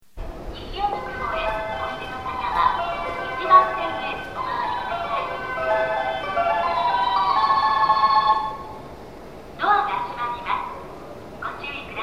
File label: ogikubo bell; chuo; jreast